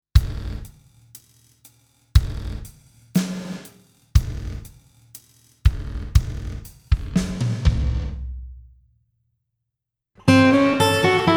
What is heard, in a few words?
Nonlin 1